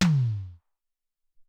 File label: Syn Tom, Alesis SR-18